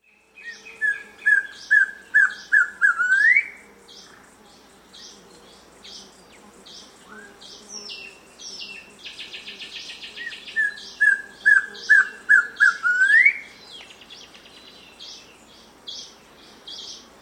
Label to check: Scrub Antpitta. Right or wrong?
Right